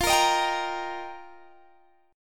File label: F 7th Suspended 2nd Suspended 4th, Guitar Open D